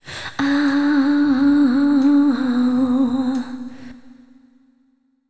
AAOOH, HipHop Vocal

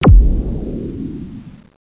bump; delta

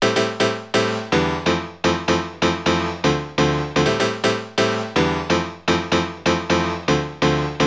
PIANO HOUSE 125 A, Keys; eJay

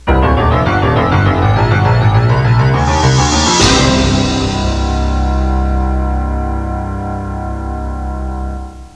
done music; QuickShot Demo; QuickShot v1.0

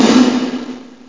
ALLDOOR, BAS PERC; eXtended Instrument

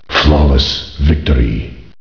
VICTORY; The Ultimate Guide to Mortal Kombat

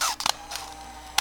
camera; PC Gamer IT CD 30

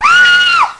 uuuh

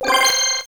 message; STAR TREK

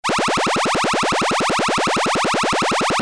Lazer

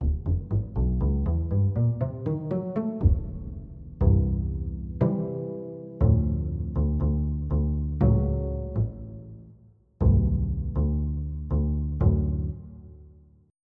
Pizzicato Dry

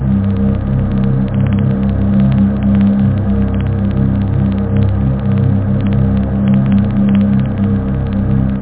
choral, synth; da capo vol1

